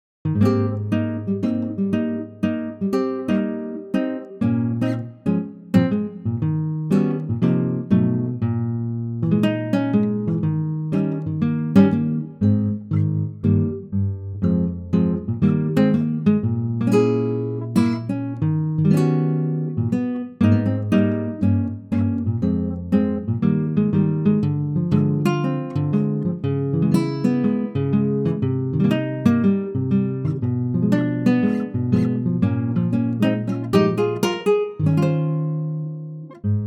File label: acoustic guitar Ab